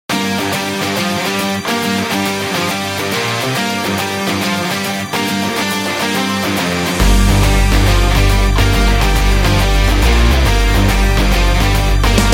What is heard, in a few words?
Commercial Rock